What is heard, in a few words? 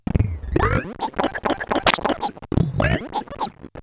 Bent Talking Teacher, Circuit Bent